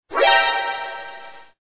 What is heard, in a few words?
alert, ringtones; Metal Gear Solid